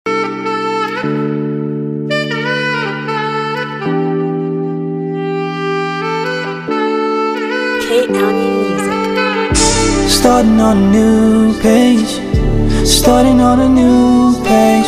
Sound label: 8 Beat Hook